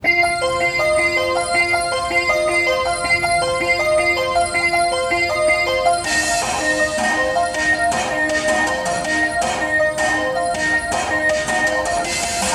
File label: Laptop Music; sons of the forest